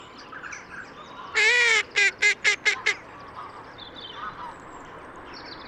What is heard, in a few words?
Northern Shoveler Spatula clypeata